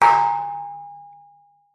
impact metal pole hit strike hard object resonate ring